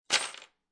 Keys Drop On Wood Table, Foley, Keys Dropped Onto Wooden Table; Objects